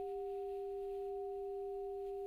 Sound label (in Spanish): Multiarmonicos